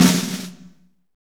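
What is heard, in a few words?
snare, Jazz; Northstar - Drumscapes Roland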